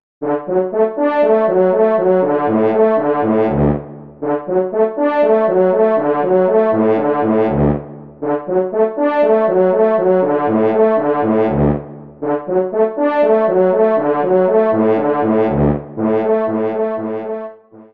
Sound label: Trio Allegro